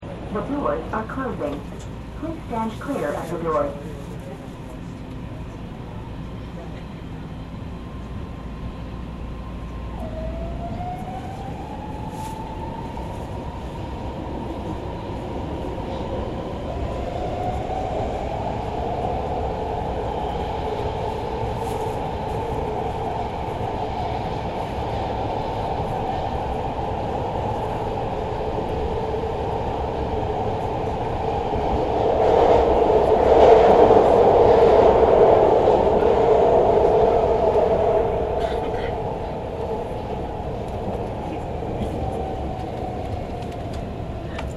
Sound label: Subway train interior; Subways; Vehicles; Yvette Jackson